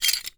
Silencer Broken

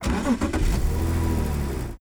CAR Start Engine

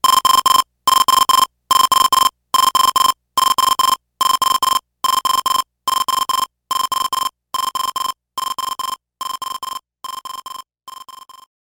Alarm; Nintendo DS System Music

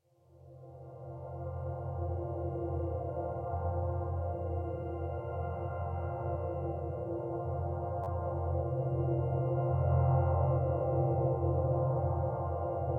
Forest Ambience

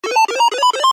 unlock; Strong Bad's Cool Game for Attractive People; peasantry

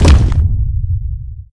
Dino Trex Stomp; doodle jump 2; android

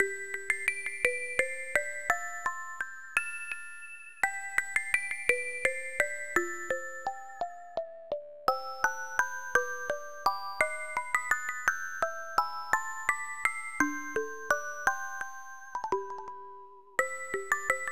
Music Box Tune, snes

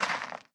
sand footstep; adams venture origins

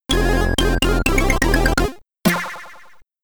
SID Stereo; Game Over; Ball Fever; commodore 64

